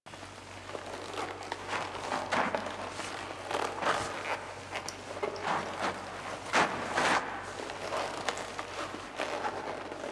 Blade Against Throat; Duviri; Teshin

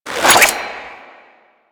Grapple Windup

